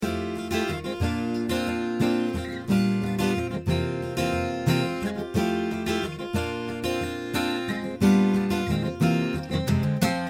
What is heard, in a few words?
condenser acoustic